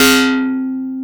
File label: SYN, 160 BPM, Drum & Bass